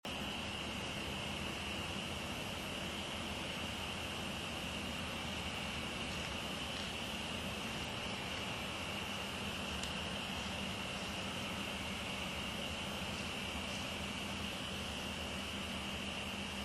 walking in camp; Retreating from camp 02:30hrs; Louisiana

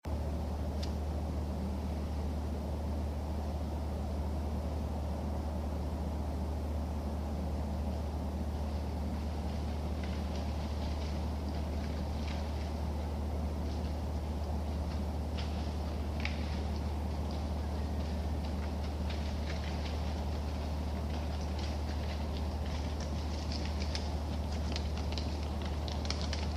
creek crossing

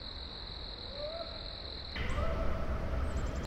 whoop comparison; Texas/Louisiana Whoops; Here are the 2 whoop recordings looped together in a single audio file, the Texas whoop is first followed by the Louisiana whoop.

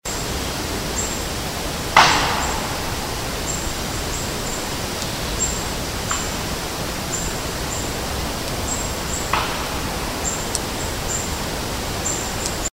knock

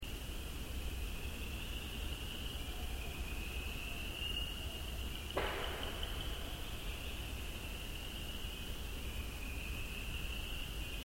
wood knock; by one of our researchers whom was camping alone in the field

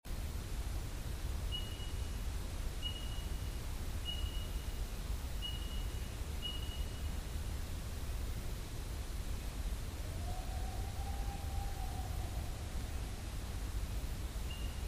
howl; Unidentified Howl 22:59hrs 3-13-10; Bigfoot Research

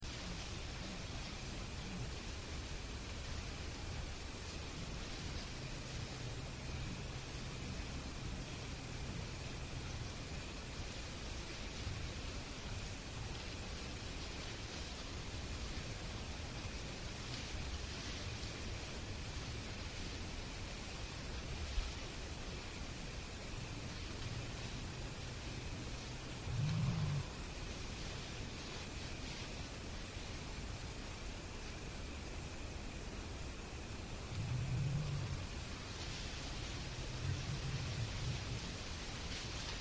growl

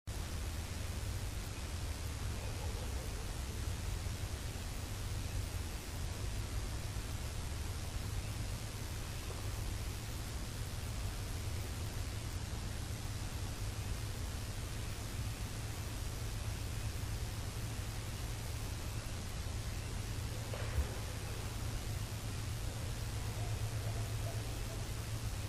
wood knocks

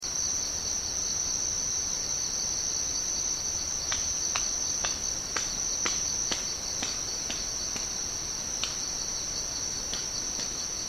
clacking; This was recorded in a National Forest in Louisiana, at 12:45AM on the morning of 5-15-10.

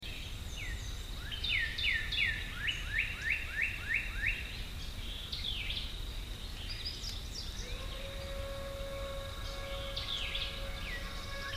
vocal; Unknown Vocal; This was recorded on private property in an area where other activity of interest is ongoing; Bigfoot Research

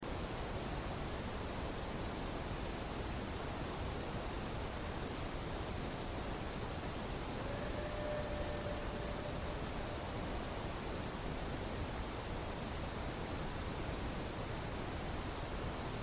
unknown vocal location

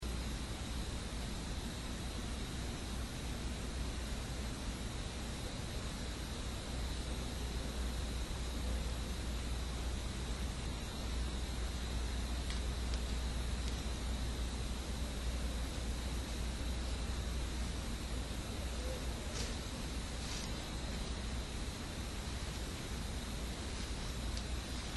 walk up; Walk-up recorded 11:15PM 4-05-13

